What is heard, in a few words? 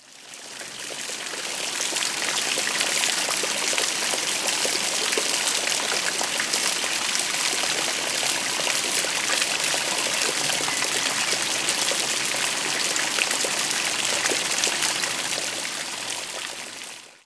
fountain small; best service Blue Box; water fx